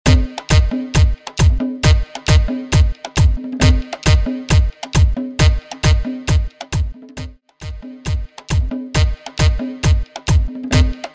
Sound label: intro break outro 135 BPM